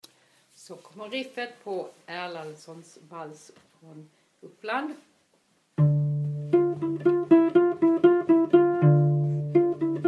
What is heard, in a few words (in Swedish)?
vals riffet; Erlandssons